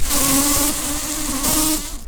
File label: bumblebees, Pascuorum queens